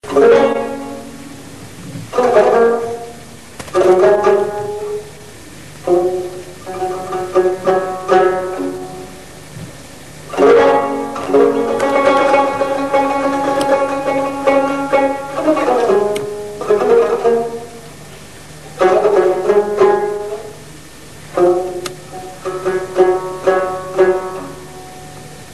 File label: rubab